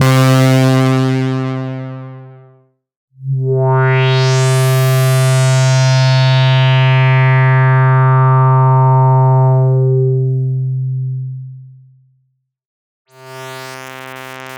ARP Odyssey Pads

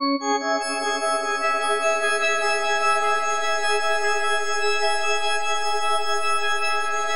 FM C# MIN; Bolder Sounds

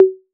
808 SOFT; Wizoo - Platinum 24 Electronic

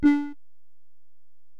Bell, Alesis DM-PRO

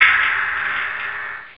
ohh; jazz jackrabbit 2

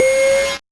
Noise A, 8-bit, Bitty Noises

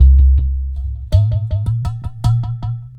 Udu

